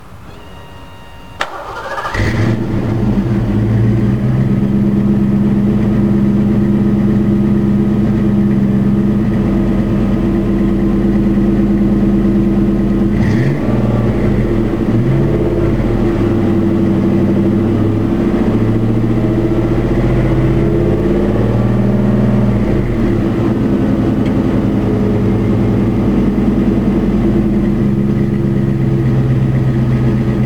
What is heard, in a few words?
91 mustang lx exhaust sound; livonia mi us